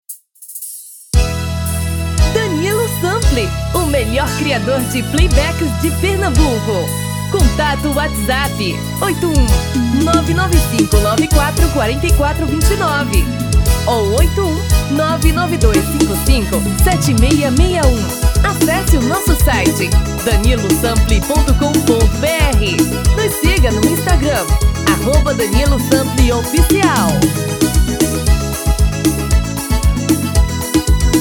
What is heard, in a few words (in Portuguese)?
Forro das Antigas